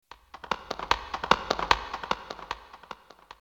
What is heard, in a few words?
voice horse; maoudamashii